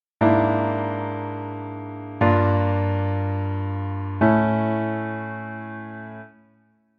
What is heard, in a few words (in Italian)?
Serie d accordi